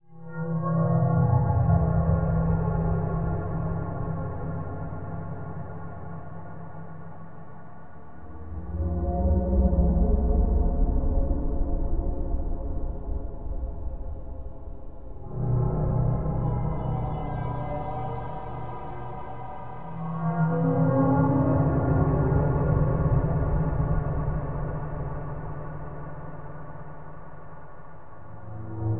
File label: Eerie Ambient Music